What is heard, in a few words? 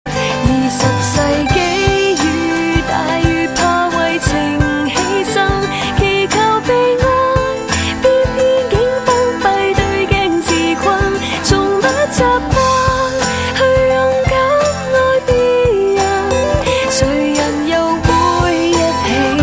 Ping Pung